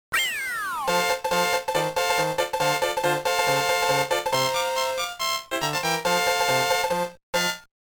Course Clear; Super Mario Advance 2

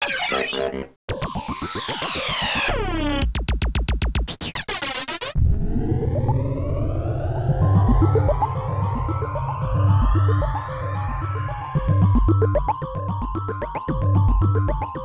Game Start - Main Ambience; Solvalou; Namco System 21

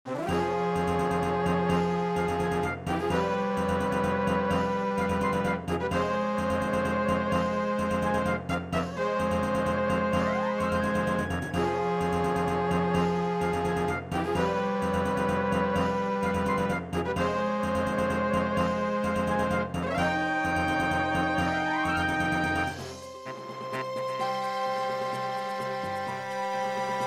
Negative; Star Merc

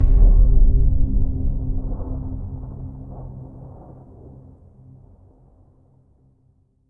Combo C; Mixolumia

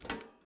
metal jump; teardown; ps5 windows xbox series xs

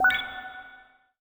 Popup

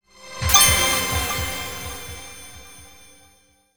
SE STAGE OPEN

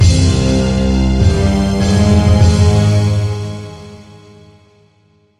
Veil Victory

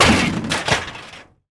metal break; teardown ps5 windows xbox series xs gamerip 2022

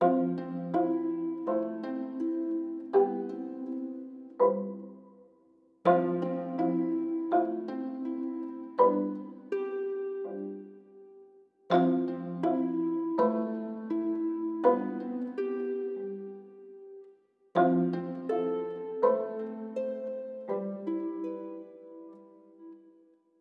Music Level Argument Loop

